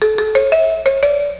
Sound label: logon; xfce